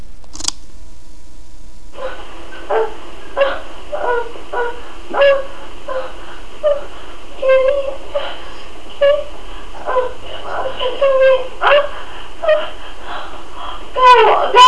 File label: masturbation